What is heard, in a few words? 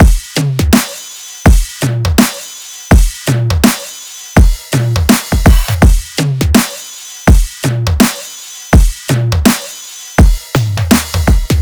Full Drum Loop, 165 BPM; Future Bass; Drums; Heater